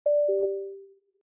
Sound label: bip bop